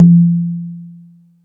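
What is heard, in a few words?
Jungle Percussion, Drum Machines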